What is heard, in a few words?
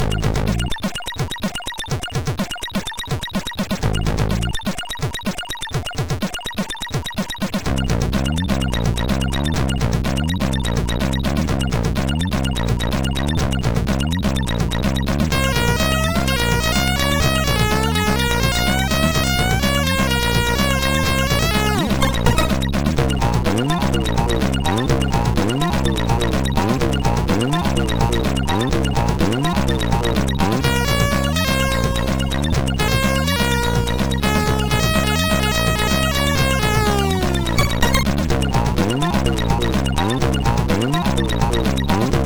Ferry Funny, sid MOS6581R4